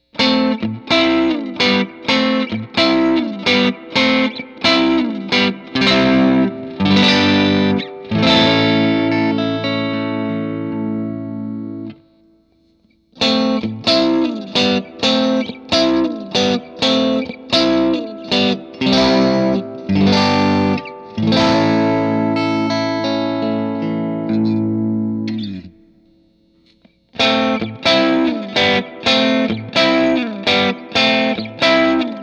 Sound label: G&L USA Vintage; Minor Chord; White